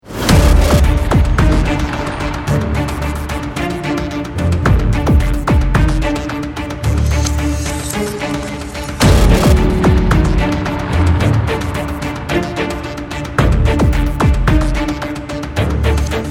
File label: bomb planted, music; Counter-Strike Global Offensive